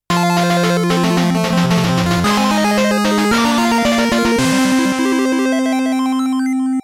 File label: Final Boss Clear; Mega Man 10; PS3; Xbox 360